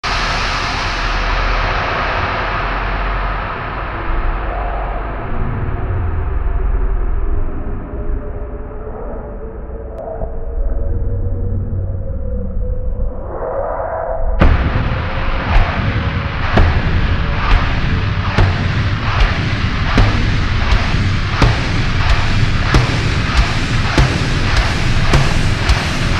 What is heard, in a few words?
Synth, Instrumental